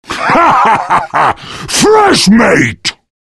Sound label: hahahaha